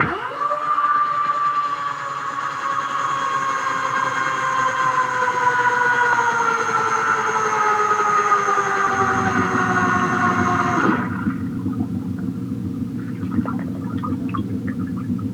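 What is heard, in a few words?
long motor, Underwater Drill; SFX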